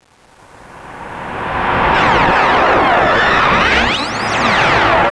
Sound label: SHOOTING; Roly-Polys no Nanakorobi Yaoki